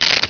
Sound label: slider; Microsoft Graphics Studio; Home Publishing 99